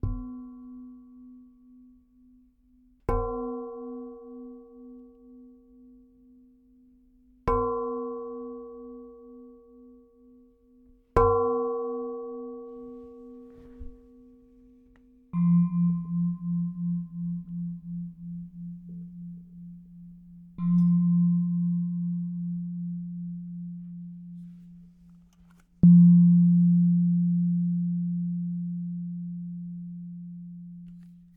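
Singing Bowl Variations For Looped Meditation Pattern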